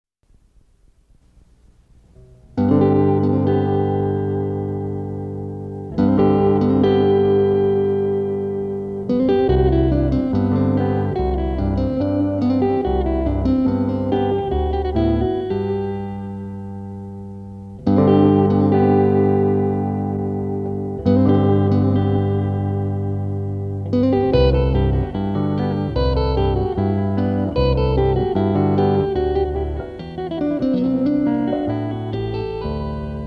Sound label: Minuet In G